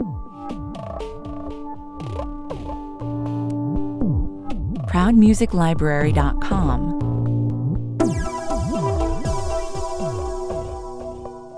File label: (intro) 120 bpm